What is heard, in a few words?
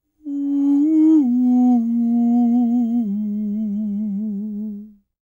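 falsetto, Vocal Planet Jazz FX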